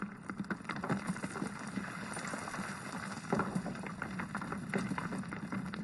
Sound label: Land Slide